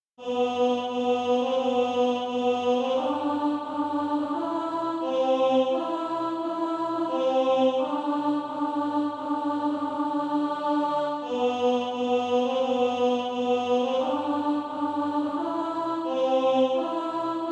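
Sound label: alto, Sheet music (SATB) including Practise Music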